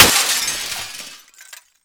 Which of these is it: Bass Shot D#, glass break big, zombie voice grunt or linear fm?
glass break big